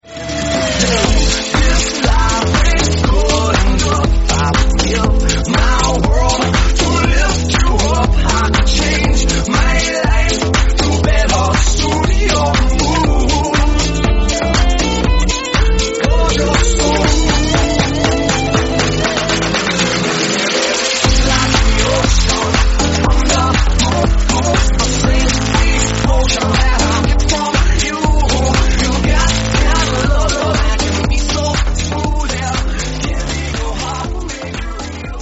120bpm Am

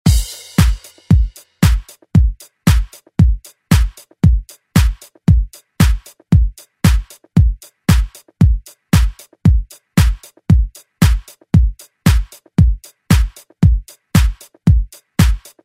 90s; ReDrum

